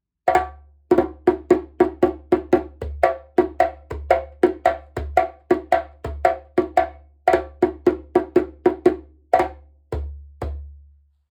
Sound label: djembe; mali dougoura 38cm; ボディ マリ 打面 38cm、高さ62cm、重量10.2kg 木材 ドゥグラ ヤギ皮 厚め